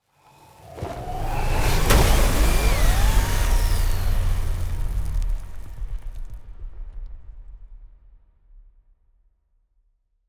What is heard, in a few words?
ship transport ship move; Stellaris; toxoids; ships